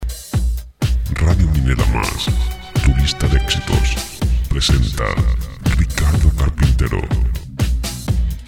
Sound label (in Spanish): efectos radio; PRESENTACION; radio minera mas